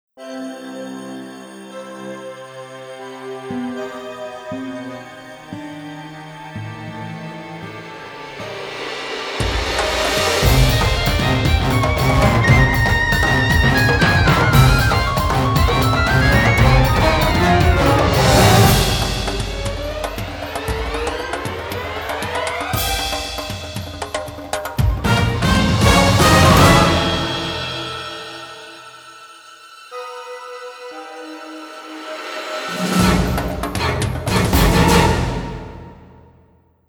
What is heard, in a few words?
Lullaby; Monster Hunter Hunting Music Collection Special Pack